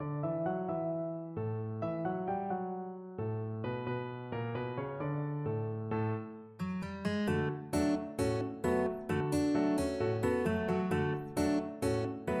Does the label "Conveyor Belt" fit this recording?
No